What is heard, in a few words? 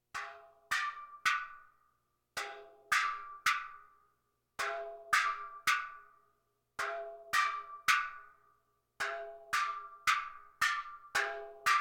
Percussion Bongos Tympani rolls Gongs Drums; Valentino Production Sound Effects Library CD50